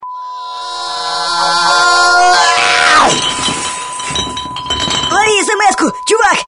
smska ypala, tones

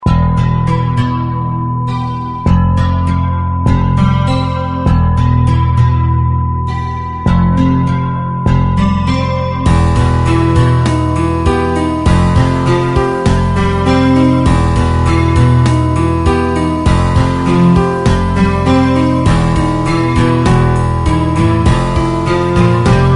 flame, tones

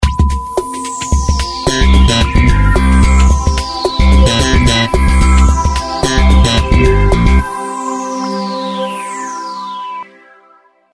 slap, tones

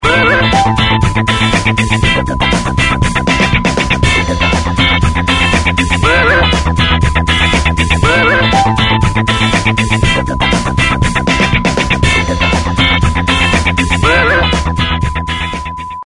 disco, tones

